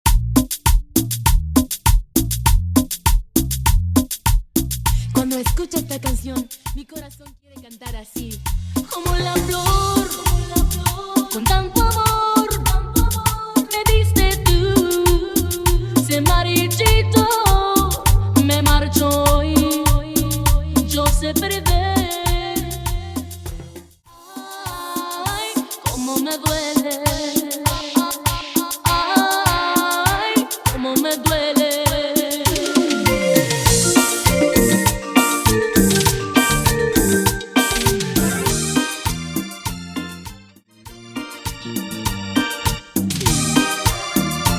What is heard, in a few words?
Cumbia 100 bpm